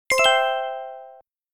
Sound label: correct answer